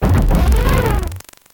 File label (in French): Cri